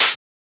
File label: Open Hat; FLPRO